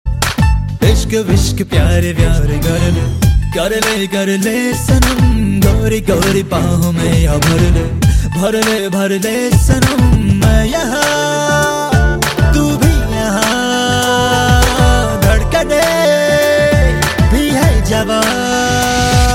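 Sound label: violin; Ishq-e-mamnoon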